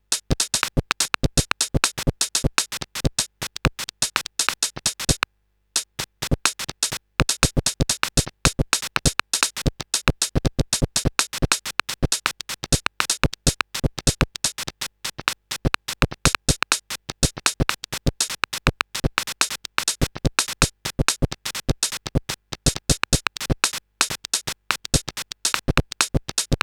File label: noisebeats; rauschpartikel